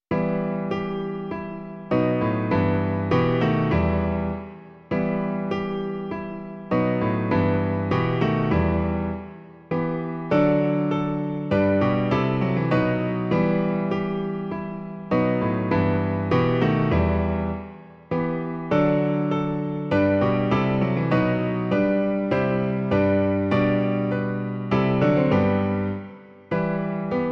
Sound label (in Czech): klavír; Rač, Bože, požehnati; Klavírní verze